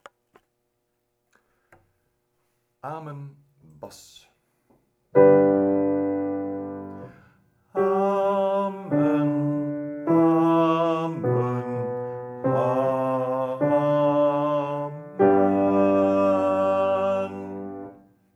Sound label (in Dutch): Amen bas, vierstemmig